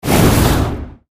pickaxe; BeatSwordland

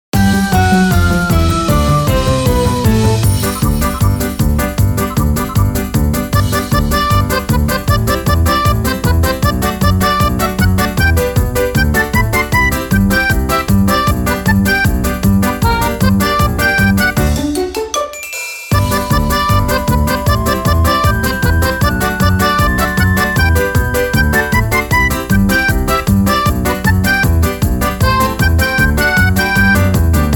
happy

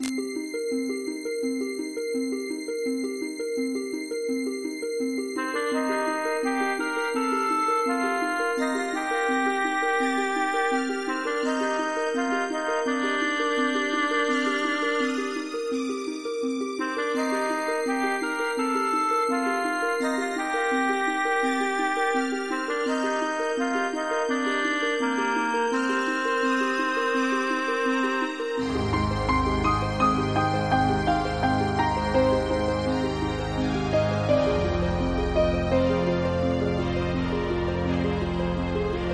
Dungeon

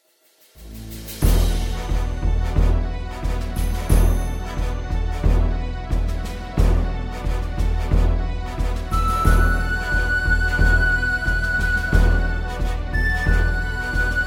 bgm

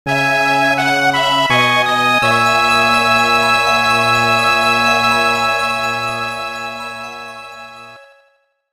game over

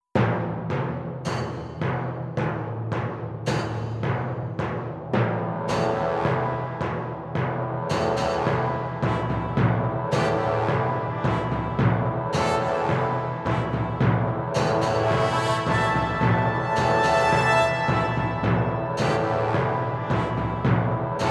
bgm

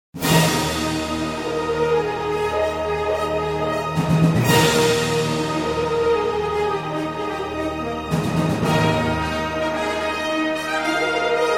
bgm

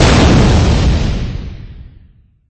big bang; LiveOrDie

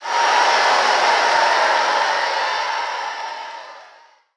cheer

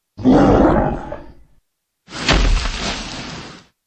monster

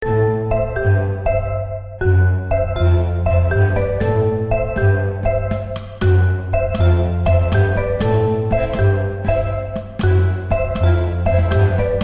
Dungeon

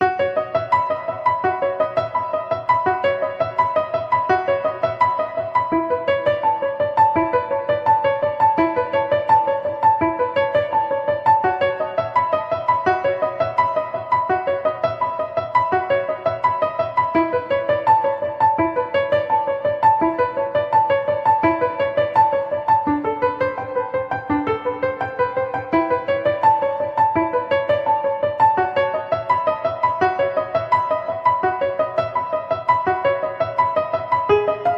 Piano

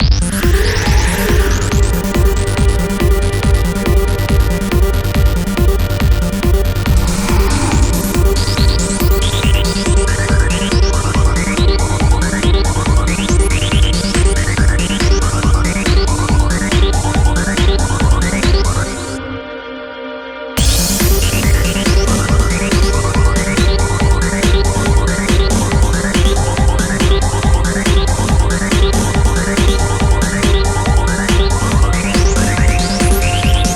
Violence

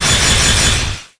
ice

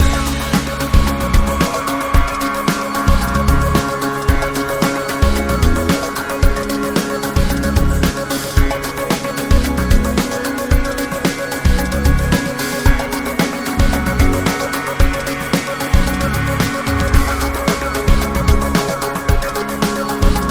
Metropolitan Mystery

